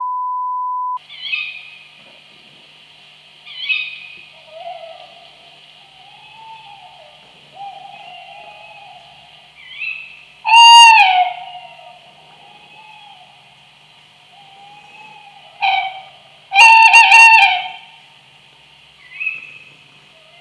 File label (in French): chouette hulotte